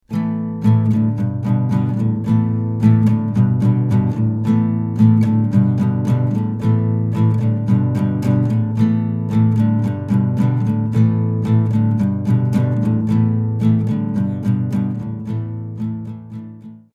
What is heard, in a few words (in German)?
Gitarre mit Zoom Kondenser